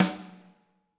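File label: snare drum, Drums; Bob Clearmountain Drums I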